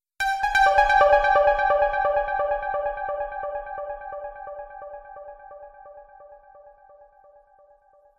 wave sms ringtone; samsung wave s

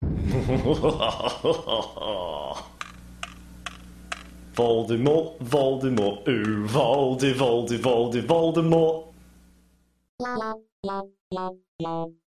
funny man singing tone ringtone

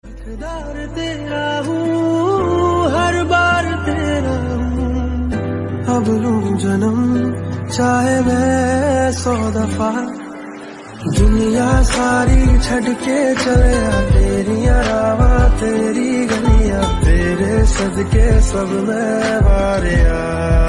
hindi ringtone, bollywood ringtones

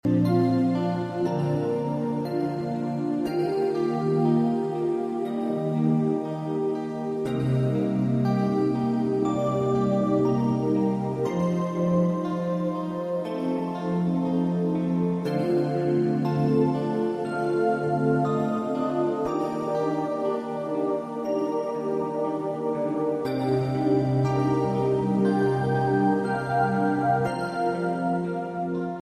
lovely music tone ringtone

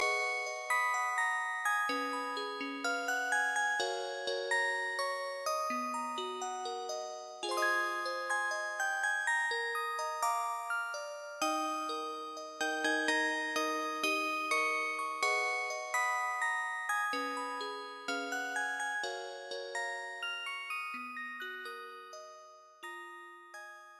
melody ending ringtone

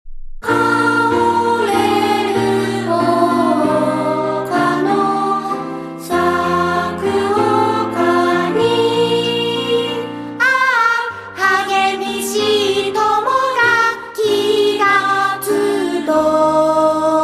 anthem ringtone; k on

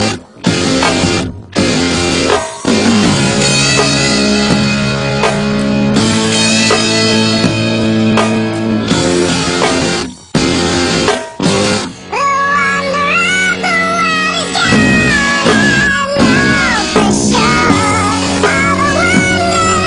theme chipmunk ringtone funny

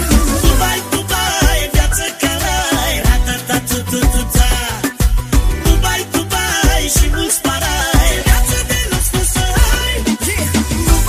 arabic ringtone

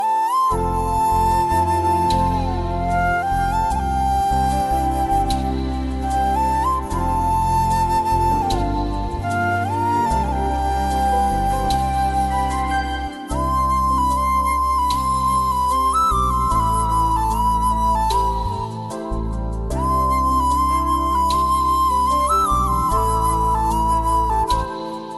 sweet ringtone music

